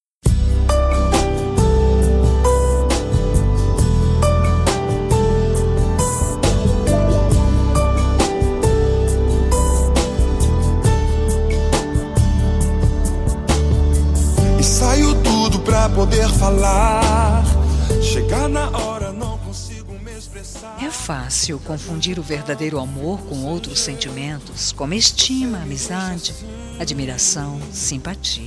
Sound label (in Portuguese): romantica evang fem